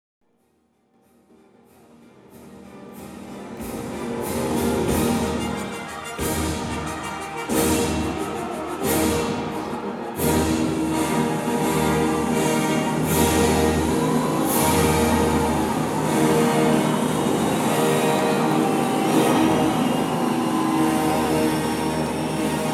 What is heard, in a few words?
intro music